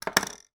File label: household comb nitty gritty head lice metal put down table; sound effects one